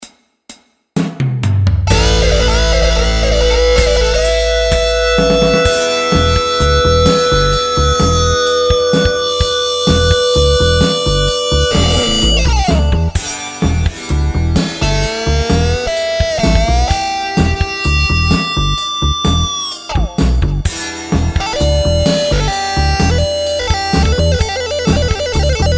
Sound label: Slayer softsynth